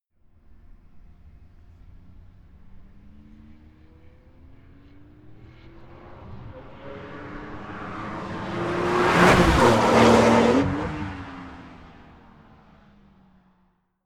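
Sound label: sports car exterior porsche gt2 drive by fast; verkehr-transport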